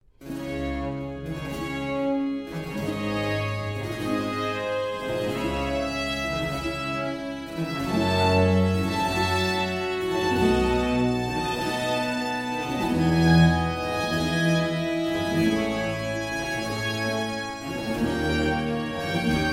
Concerto For Strings And Continuo In D Minor, Largo; Vivaldi; The English Concert